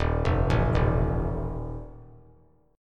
Eb Suspended 2nd Flat 5th Eb 3C 3F 3A 3D; Ebsus2b5 arpeggiated; JGuitar

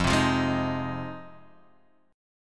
F Minor 7th F

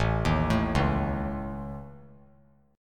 A Major Flat 5th A, chord; AMb5; arpeggiated; JGuitar

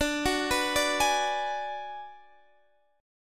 D 6th Flat 5th D down Guitar DADGAD, chord

